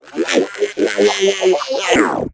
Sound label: Robot; little robot sound factory